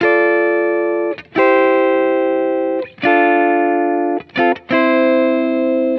Electric Chord Loop, 160 BPM D Min; Cymatics

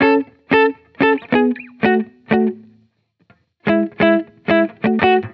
Electric Chord Loop 180 BPM F# Min; Cymatics

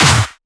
SnareClap; FLPRO

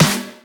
Snare; FLPRO

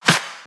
Shifted Clap; FLPRO

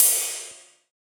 Ride; FLPRO

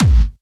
Kick, Kicks; FLPRO

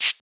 Shaker; FLPRO; Shakers

